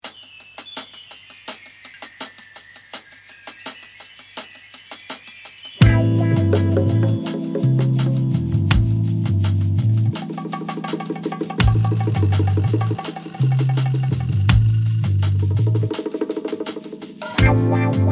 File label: Slow and Cool Guitar Groove